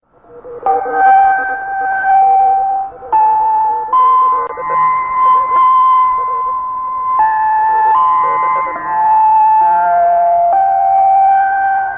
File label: interval signal; fujian front